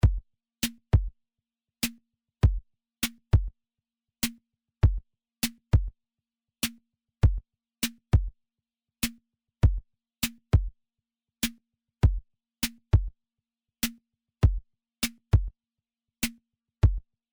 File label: drumloop dry; United Plugins